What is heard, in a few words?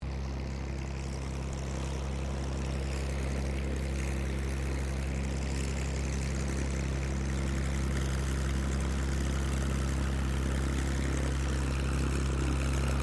AIRCRAFT LOCKHEED HUDSON IDLE, Hudson twin engine light bomber; 1941; Airplane; Vehicles